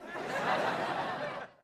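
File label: Crowd 100 Short Light Medium, medium interior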